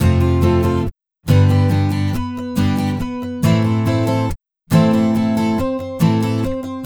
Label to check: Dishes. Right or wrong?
Wrong